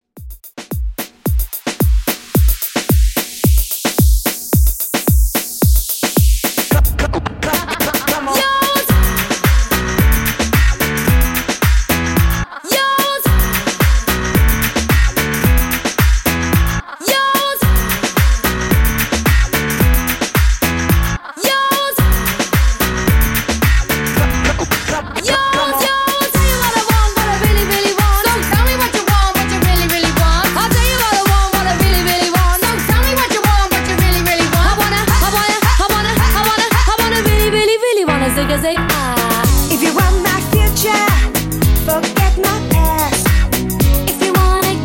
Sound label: Redrum 110Bpm